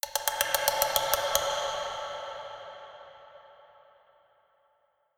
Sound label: Percus